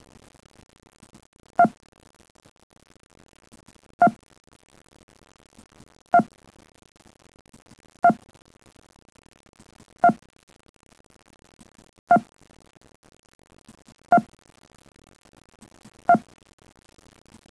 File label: beep